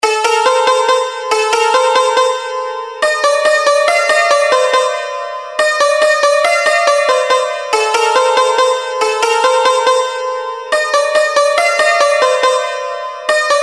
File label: santoor